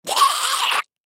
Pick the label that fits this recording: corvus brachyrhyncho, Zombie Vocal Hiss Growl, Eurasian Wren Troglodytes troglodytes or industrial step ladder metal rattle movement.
Zombie Vocal Hiss Growl